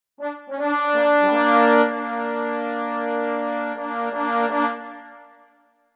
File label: trombone; kontakt player; sibelius